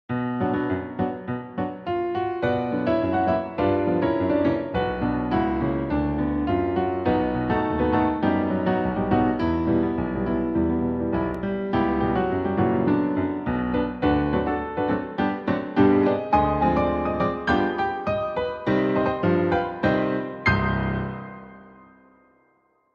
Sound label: Dance Music Only